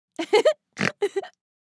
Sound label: Funny woman laugh